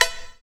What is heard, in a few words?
SYN SPACE, dance synth; Sound & Vision - Gigapack I CD 2 (Roland)